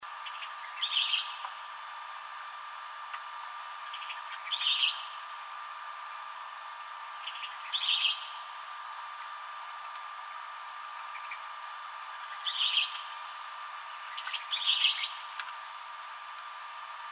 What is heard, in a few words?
Western Kingbird